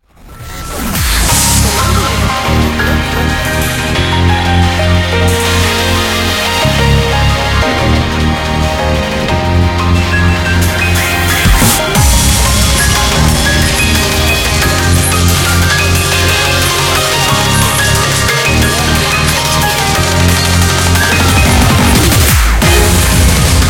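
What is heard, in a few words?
background music crossfade; Invaxion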